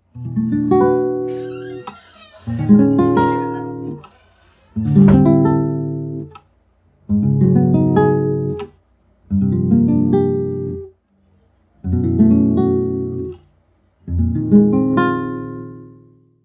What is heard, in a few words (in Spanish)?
ejercicio basico de cejilla, guitar